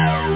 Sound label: klaxon; moonbases demo